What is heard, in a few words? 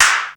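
clap analog; 300 Drum Machines; Yamaha Motif ES-Series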